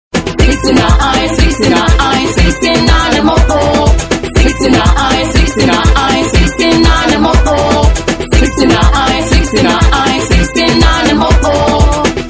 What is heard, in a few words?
club vocal